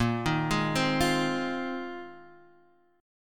A sharp 9th Suspended 4th A sharp Guitar Half Step Down